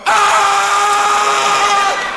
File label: scream